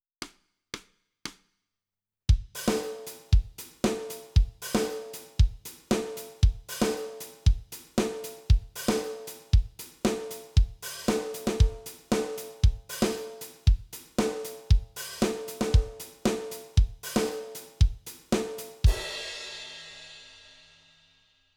Drums

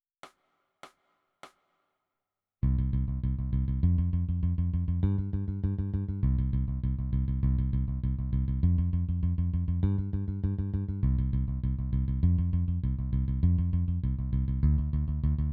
Bass

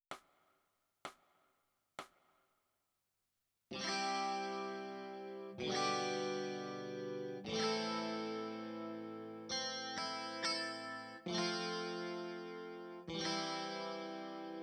E Guitar

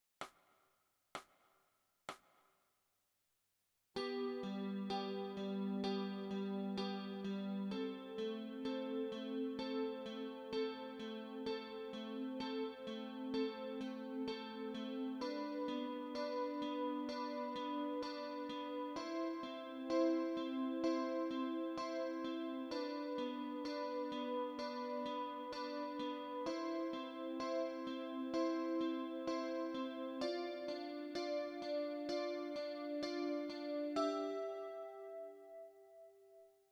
Chorus Guitar

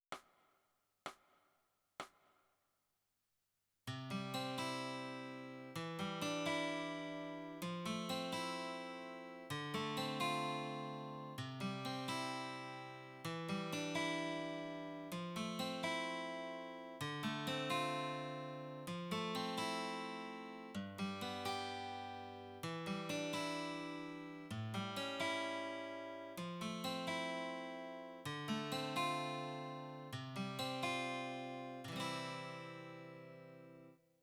Guitar

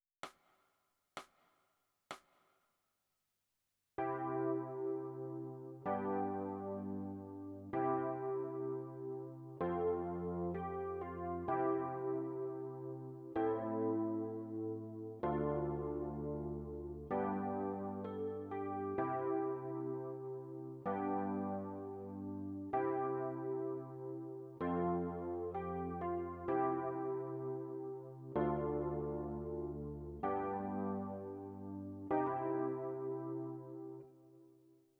E Piano

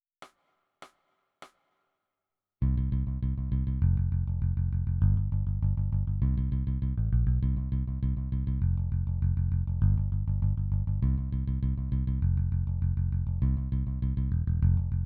Bass

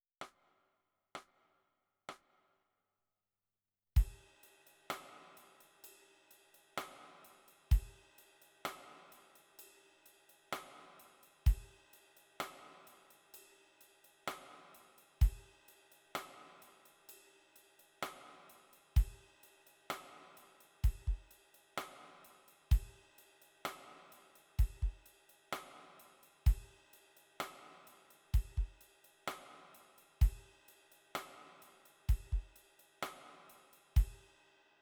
Drums

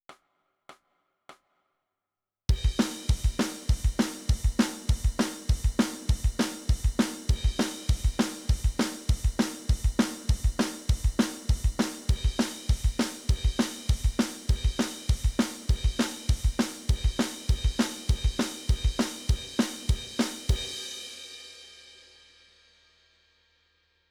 Drums

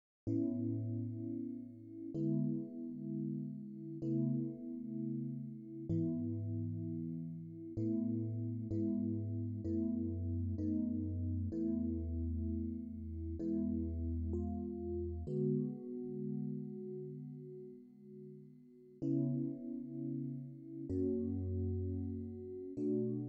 E-Piano; Beethoven; プロの音プロの技・令和版

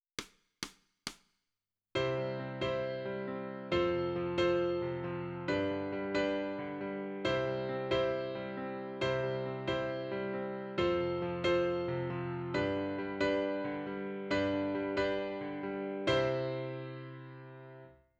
Piano

